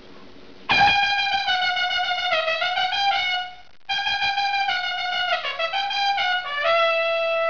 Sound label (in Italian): tromba